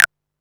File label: PERC, Techno 138 BPM; Best Service ProSamples vol.54; AKAI